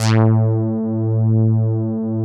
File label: DRY BASS; Masterbits; Dance Trax